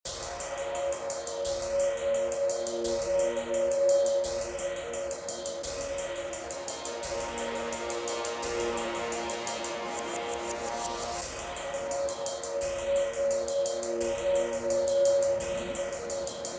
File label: Drums